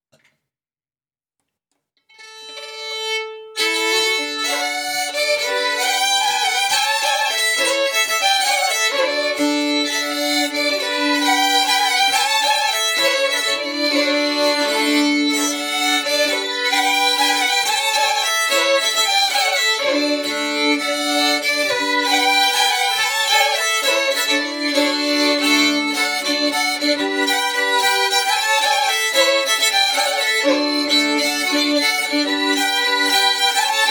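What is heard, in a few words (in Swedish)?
Polska, svensk säckpipa; efter Ernst Pettersson Brattfors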